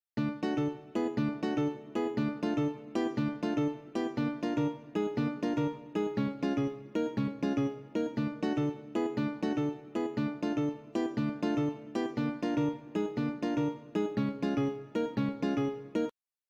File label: cont rhythm example